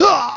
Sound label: death